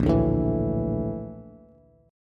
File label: A sharp Major Sharp 11th, Bass, Bass Standard; A#M#11; strummed; JGuitar